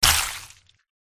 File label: zombie attack contact up